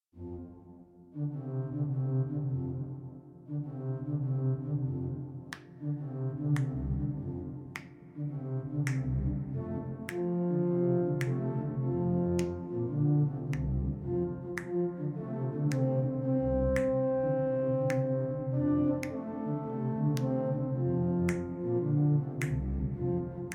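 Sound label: Swing Low Sweet Chariot, organ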